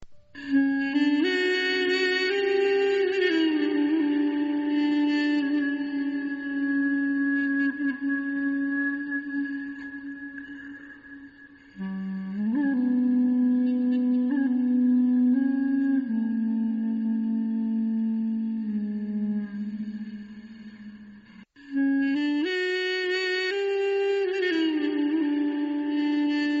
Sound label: horn mini; avatar tsungi